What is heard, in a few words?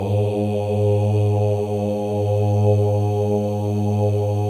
male ohs; Spectrasonics; Symphony of Voices